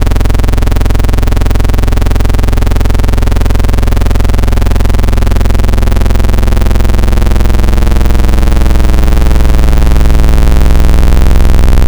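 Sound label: Digital Square Saw Bits, Wavetables; Synthetic's Serum Collection; ESW Core Wavetables; Echo Sound Works Core Tables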